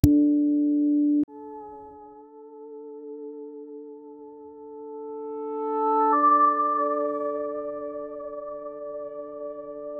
haptic vibration sound

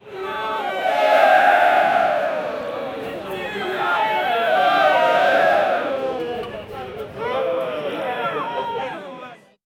a crowd of people in, arena; a crowd of people in an arena chanting the name "Mike D"

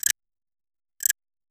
a soft distant, clock

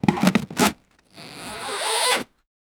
camping tent zip lock ope

